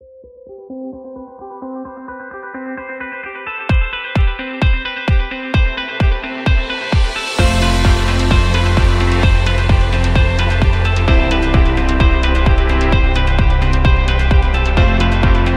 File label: Inspiring Corporate